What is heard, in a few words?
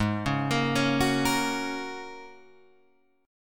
G sharp 7th Suspended 2nd Suspended 4th G sharp Guitar Open G